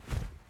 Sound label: Cloth Walk